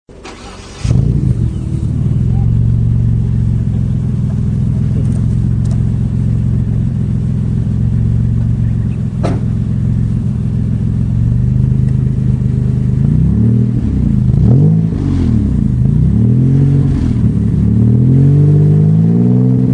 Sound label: Car Sounds Subaru Impreza WRX with big exhaust